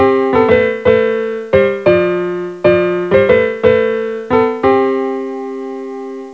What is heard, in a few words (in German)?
Klimper